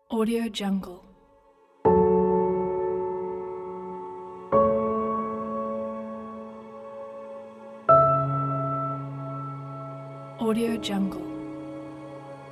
piano sadness

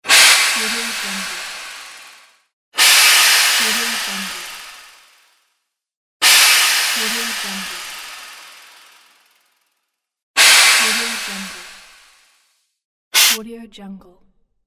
snake bite; davidbeardmusicproduction

